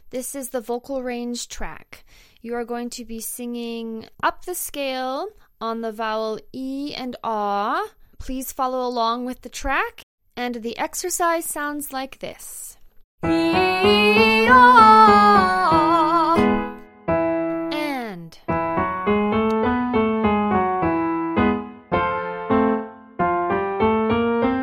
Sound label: vocal range up